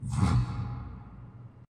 Smoke Bomb Teleport

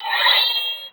Sword Grinding

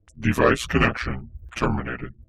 Device Disconnect

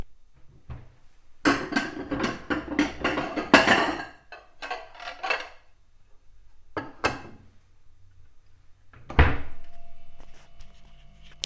Taking Two Plates Out Of Cupboard

